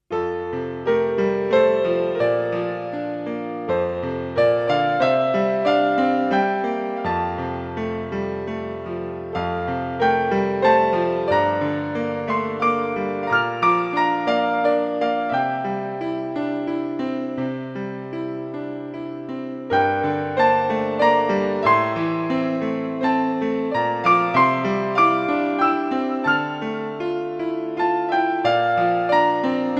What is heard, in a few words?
Eternal Love (Pianoversion)